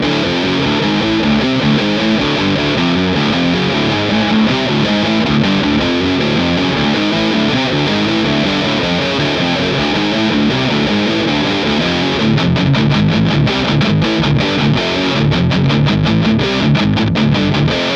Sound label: lp bridge tubeking eq